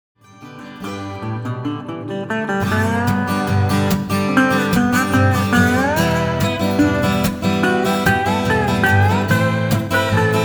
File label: stomp